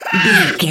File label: goblin fairy attack low